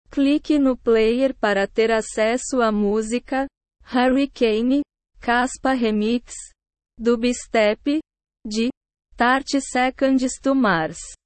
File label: remix dubstep